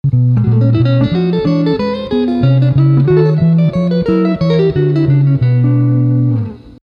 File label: jazz sweep